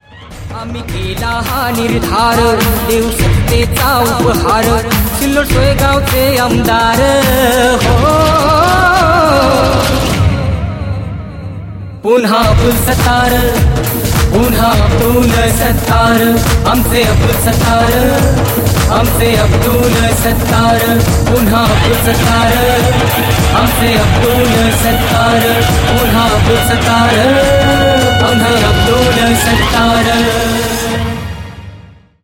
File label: Song ringtone